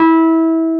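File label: Wurlitzer E2, Keyboards Old School; USB Soundscan vol.09 - Keyboards Old School; AKAI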